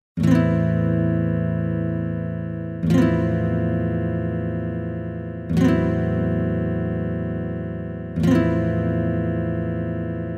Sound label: flamenco; FFIX OST